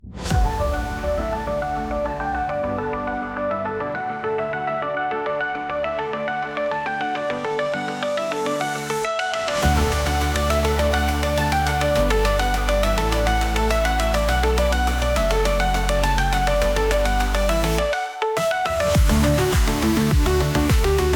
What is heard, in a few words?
dance fly music